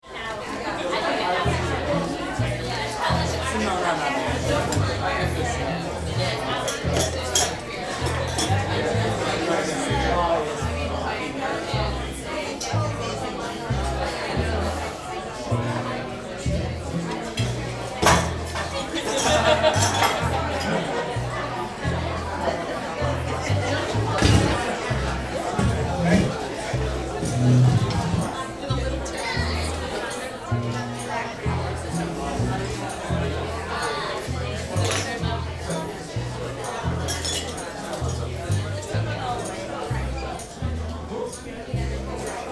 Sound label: Busy restaurant ambience people and live music